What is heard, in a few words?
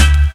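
STACK BRASS, Ragga & Dub Reggae; RAGSTACKS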